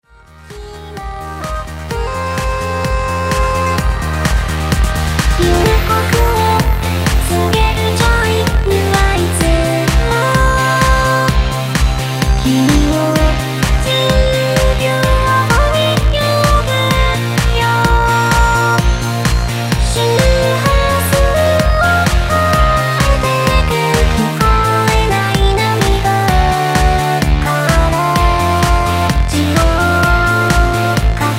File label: Sunset Radiowave